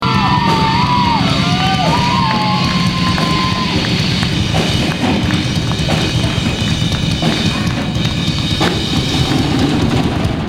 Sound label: Live Drum Solo; Metal